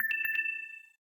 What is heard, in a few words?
Low Battery